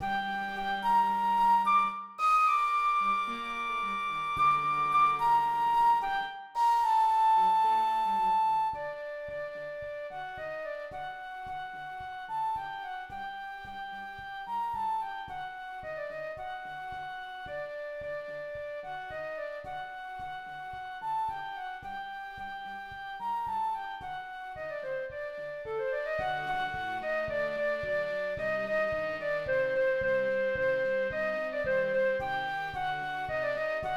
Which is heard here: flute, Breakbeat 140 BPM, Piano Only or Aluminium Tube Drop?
flute